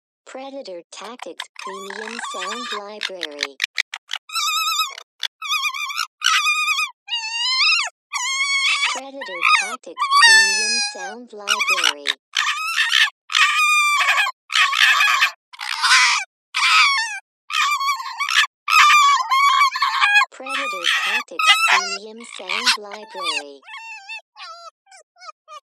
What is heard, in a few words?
Fox Fore Play